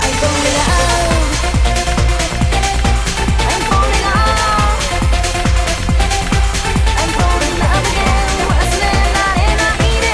TRANCE VOCAL MIX

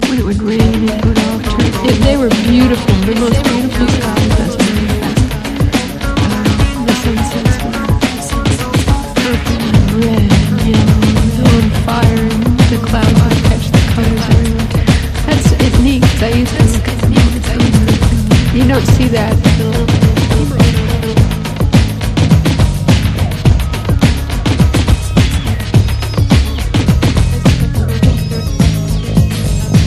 DRUM VOX VERSION; BREAKBEATS

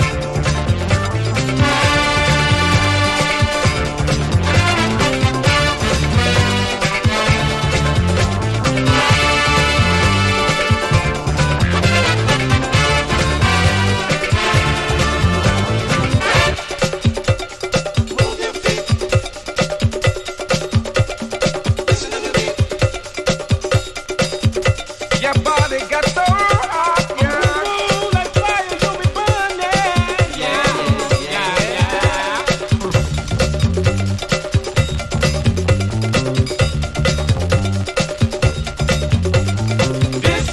DISCO REGGAE GROOVE

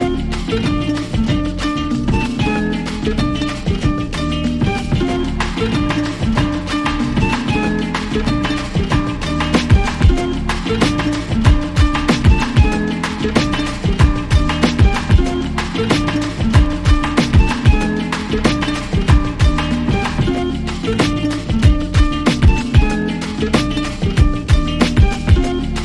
SAMBA INSTRUMENTAL

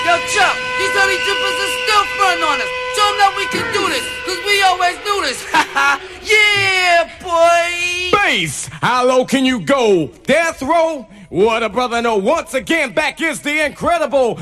ACAPELLA VERSION

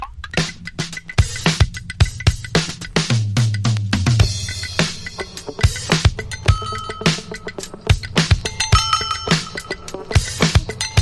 instrumental beats

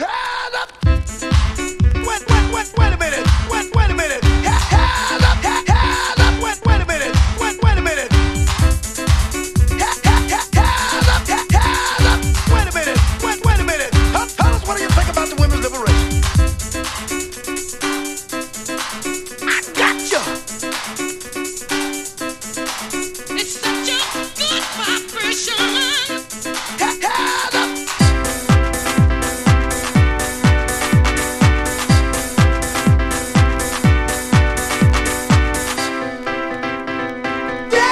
GRAND PIANO, big beat

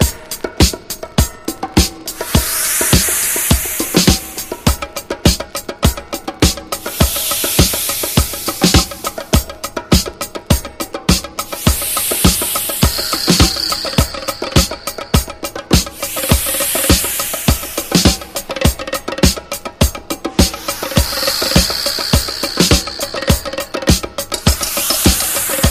drum song; DISCO

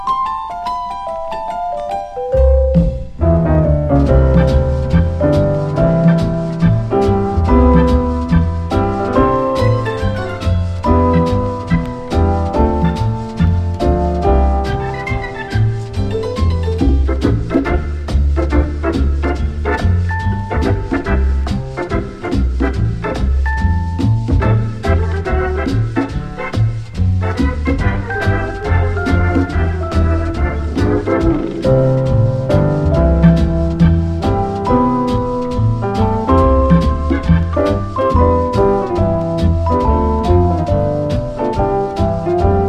CARNIVAL IN PERCUSSION

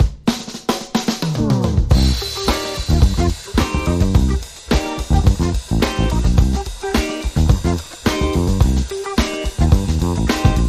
INSTRUMENTAL

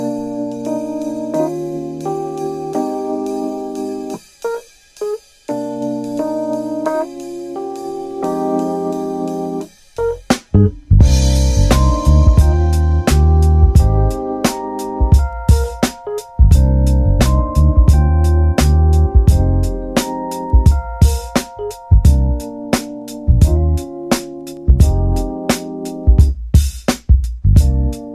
INSTRUMENTAL

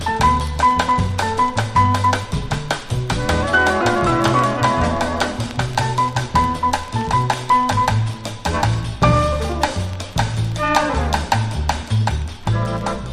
JAZZ N SAMBA

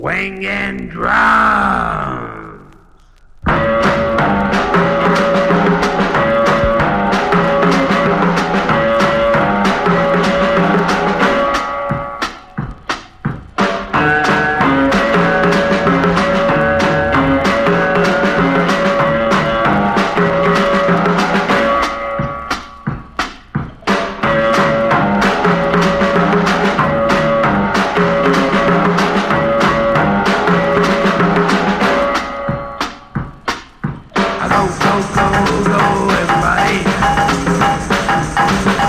swingin drums; GARAGE PUNK

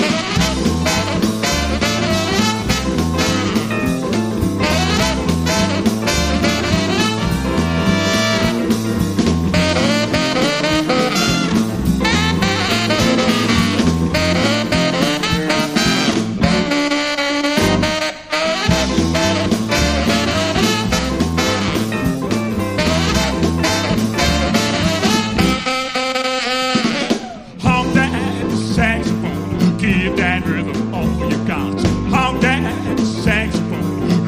honk that saxophone

